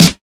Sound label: 00s piercing snare drum sound f key; snare drum samples